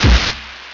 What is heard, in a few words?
SP1200 KIC, Kix Snares; E-MU Formula 4000 Series Vol. 1; Hip Hop Nation